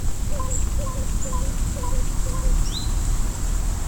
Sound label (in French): oiseau glougloute